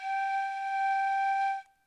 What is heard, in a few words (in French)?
tube